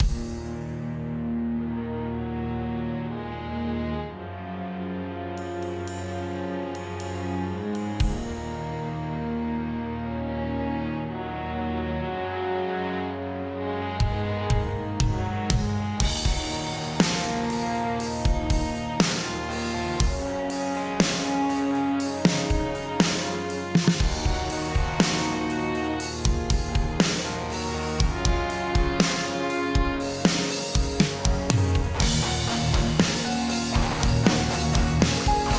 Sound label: menu mus; Original TK Sounds